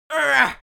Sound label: creature imp hit